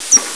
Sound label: soprano